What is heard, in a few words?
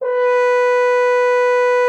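French horn H3; Xsample VOL-3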